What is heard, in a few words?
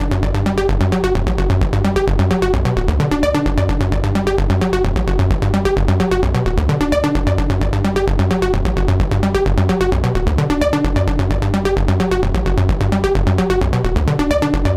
NRG Arp, trance